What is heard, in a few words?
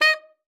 D#5, alto sax; Xsample VOL-2